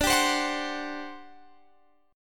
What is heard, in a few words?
G Major 7th Suspended 4th, D